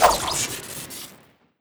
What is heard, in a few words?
knife swing crit; weapons; tf2classic